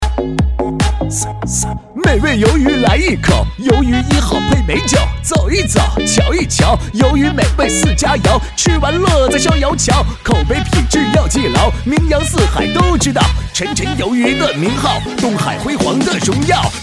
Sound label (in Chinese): 喊麦; 鱿鱼